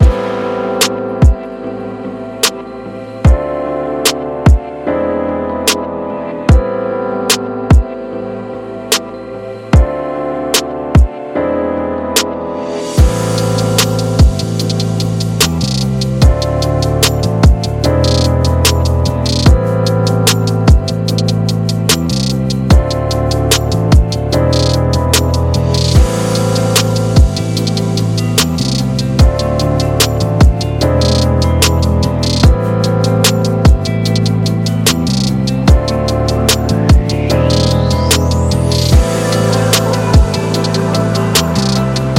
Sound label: Chill Soul